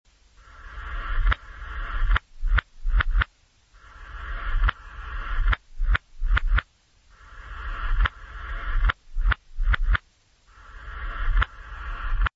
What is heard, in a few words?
Drums